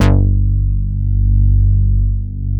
MOOG BASS G2; Best Service Dream Experience

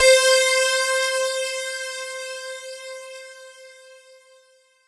C5, SynthLine; 90 s Sample CDs; NiceBeats; SynthLine VOL-20

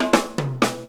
FILL

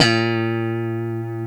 FUNK POP, STRAT; Spectrasonics; Hans Zimmer Guitars VOL-1

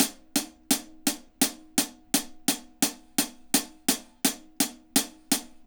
HAT, HI HAT LOOPS, Dub; Drumdrops In Dub VOL-1

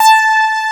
SWELL, ORGAN MIX; AKAI S6000 CD-ROM - Volume 1

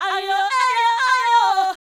Fem Vocal 128bpm G FX, African Voices; Quazibeat; African Secret; Female FX

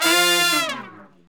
BRS Falls, Section FX; Roland L-CDX-03

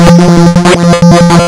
lsdj waves; wavetables

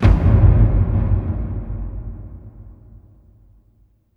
BIG BOOMAA, AMBIENT DRMS; Spectrasonic Distorted Reality 1

